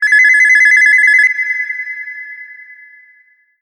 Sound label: Didi Tick, ringtones; Explay A400